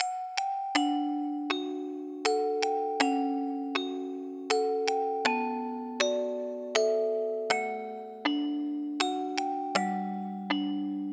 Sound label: Lullaby